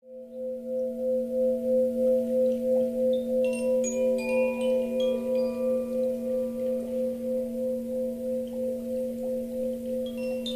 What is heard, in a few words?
sing bowl; Singbowl2